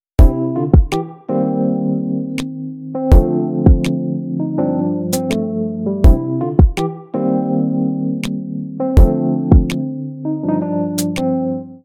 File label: music